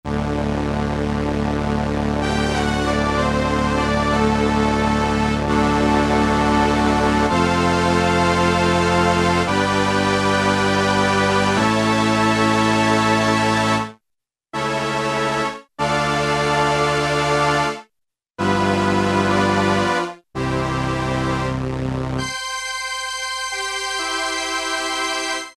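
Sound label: Strings Arp Solina; Keyboard Instruments; Roland L-CDX-02 Keyboard Instruments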